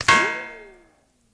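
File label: Bullet Ricochet Off Metal; riccos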